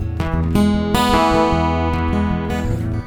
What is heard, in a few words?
Picking G